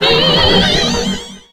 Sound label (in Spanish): Grito; Mismagius